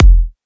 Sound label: Punchy Kick